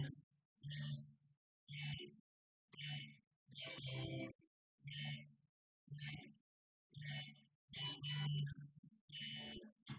wdrums synthconv; SiSEC08; SiSEC underdetermined

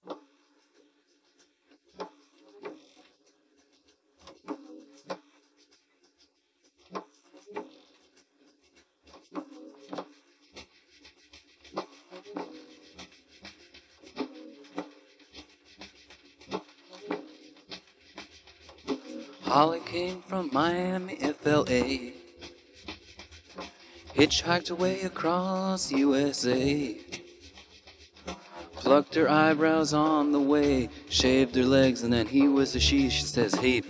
voice

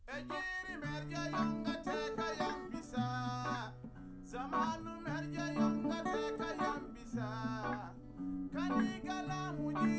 nodrums liverec 250ms 5cm mix; SiSEC10; underdetermined

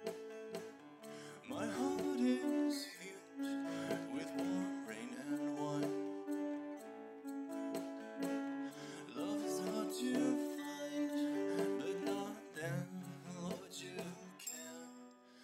guitar; SiSEC11

